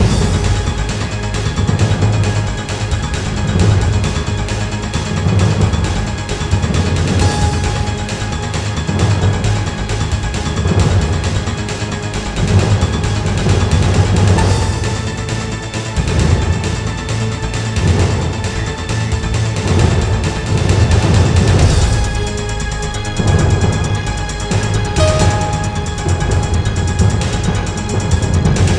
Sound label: Snare Square Dynamic; SWAT 4 The Stetchkov Syndicate